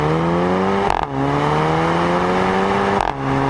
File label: GTI 7 dsg